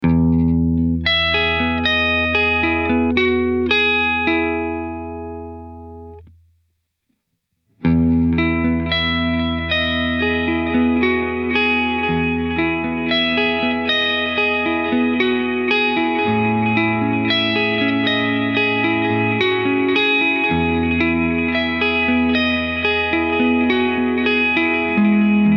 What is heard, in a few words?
Delay Filtered Feedback Hi Pass Sound